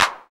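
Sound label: CLAP909, TR-909; 90 s Sample CDs; Sound & Vision - Gigapack I CD 1 (Roland); TR-808 & 909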